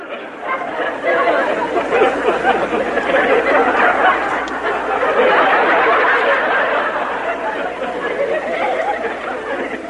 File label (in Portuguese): risada de fundo; chaves